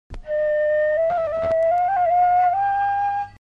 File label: Bayati tetra chord, a “Bayati” Tetra chord on the Tonic of Re (D)